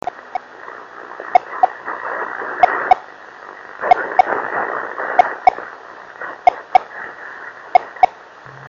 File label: heartbeat Driftnet; 26660 KHz